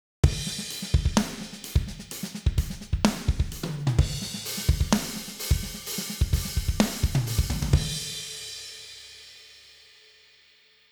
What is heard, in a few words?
Drums